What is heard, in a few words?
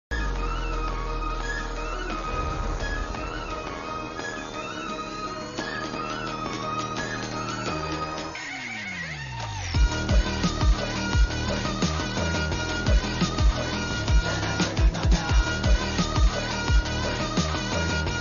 movie interval bgm ringtone; avesham